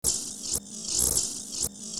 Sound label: alien insect